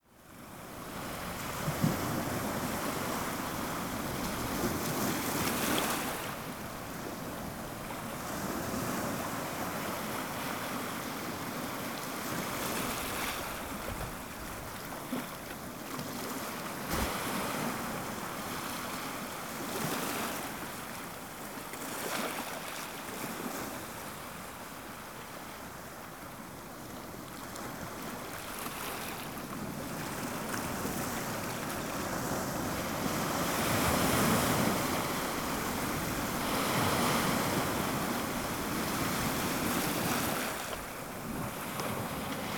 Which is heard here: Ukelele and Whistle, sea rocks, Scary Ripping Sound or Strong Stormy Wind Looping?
sea rocks